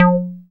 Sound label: Bass SH-101